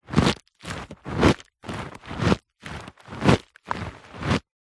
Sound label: stress ball rubber squeezes